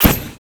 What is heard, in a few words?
spacesuit thruster start; Call of Duty Modern Warfare